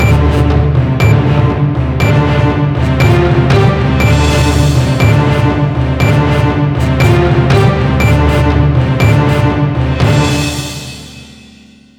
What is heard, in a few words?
intense countdown 10 sec; lastAvatarStandingTrivia